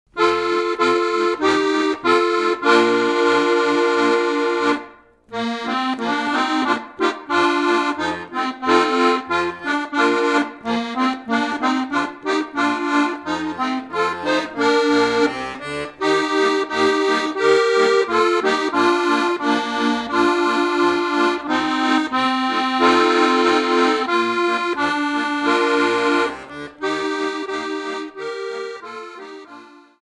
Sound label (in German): horch was kommt von draussen rein inst; Volkslieder